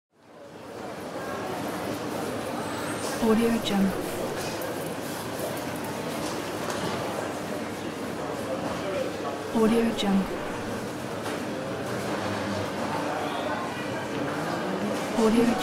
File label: airport ambience